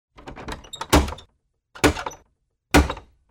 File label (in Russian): tri medlennyh udara starym knokerom; Звук стука в дверь для сказки; Для детей, для детских постановок